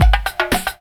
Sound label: BOOM KABOOM; Rarefaction; Poke in The Ear With A Sharp Stick